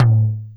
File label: RSF DD Tom, DD-30